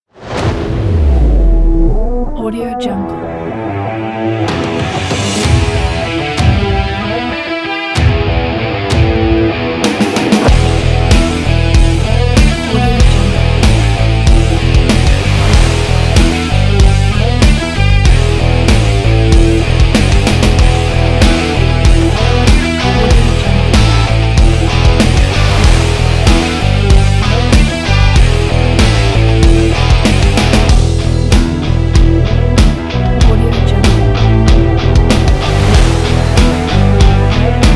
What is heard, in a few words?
heavy rock trailer; webra